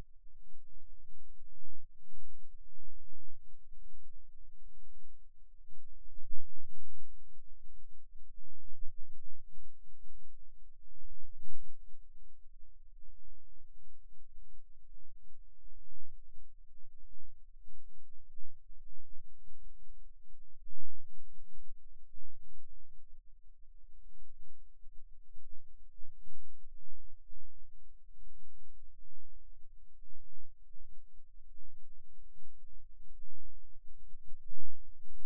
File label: Rhythm Wave, eeg seizures